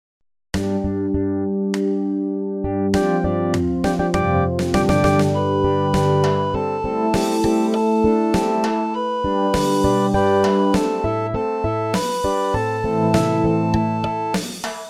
Si La suono

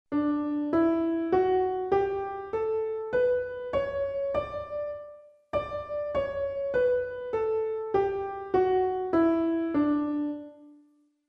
esercizio scala Re giusta

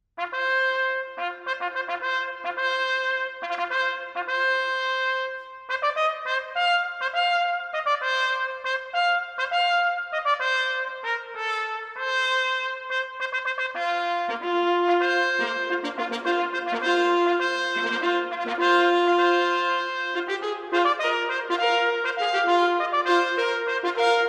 ottoni; Sonora vol B

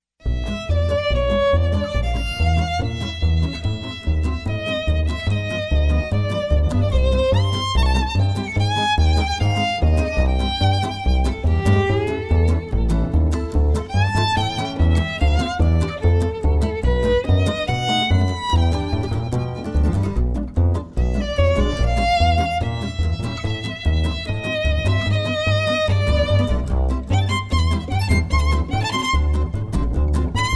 ritmo segni; prova; Sonora vol A

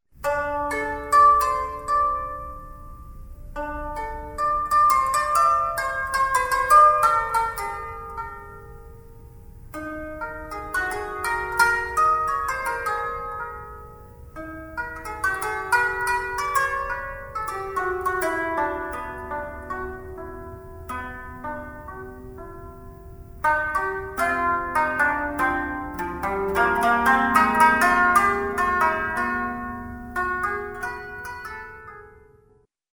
cetra; Sonora vol B

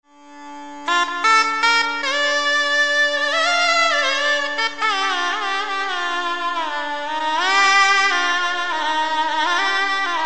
oboe doppio egizio

